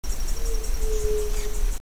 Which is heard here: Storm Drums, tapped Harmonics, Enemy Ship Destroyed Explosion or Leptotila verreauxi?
Leptotila verreauxi